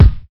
2000s bassy kick single hit a sharp key, Royality free kick single shot tuned to the A# note